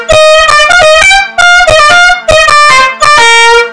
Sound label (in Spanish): fanfara